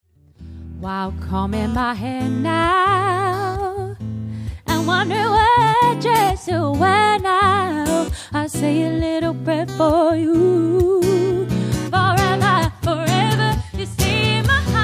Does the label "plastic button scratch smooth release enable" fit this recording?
No